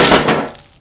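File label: grass; Mafie 1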